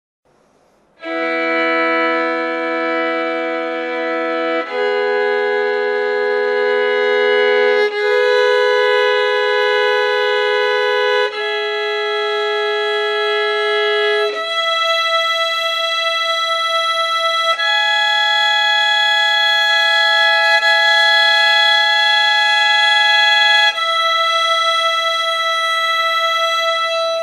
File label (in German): doublestop E dur